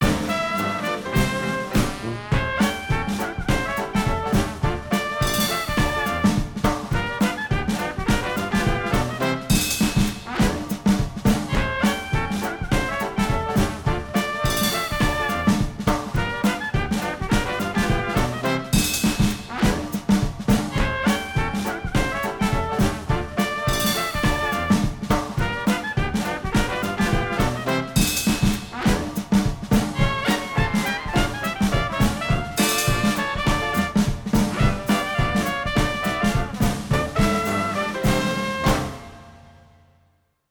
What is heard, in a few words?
Music Street Musician; Costume Quest 2